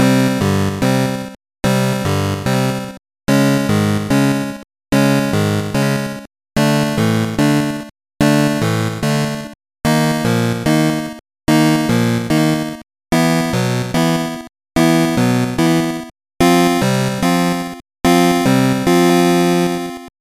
Ditty; NBA In the Zone 2000; GBC

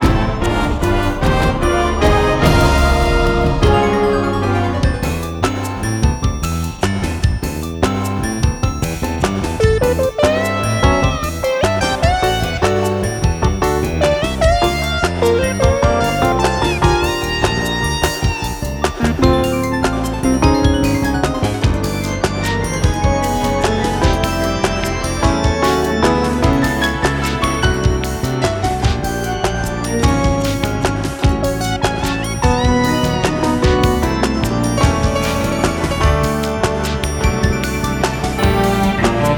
Underwater Pass

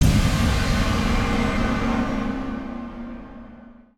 Hi Hit

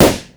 balloon pop

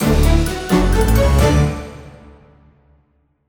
jingle objective complete; Seven Seas Solitaire

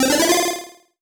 Solo Chip C64 Positive; MUSIC EFFECT; startide

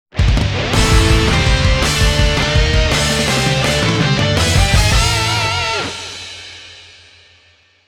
Victory Theme; Dynasty Warriors 8; ps3 xbox 360

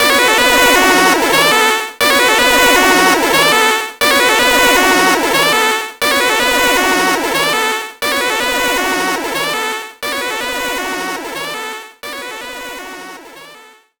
Win; hot pixel; psp

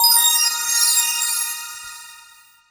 magic; Spanish

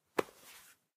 Slip; Secrets of Magic The Book of Spells

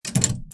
Motel Door open

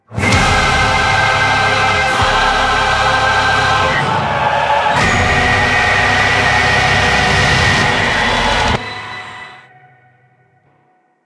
heavy transition; Doom

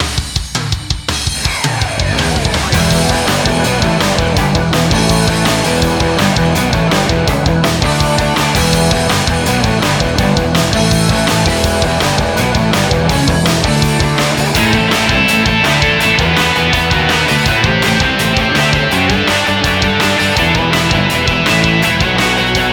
Bonus Stage; contra evolution arcade gamerip 2015

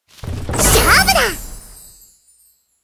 bubble match stop; Line Bubble

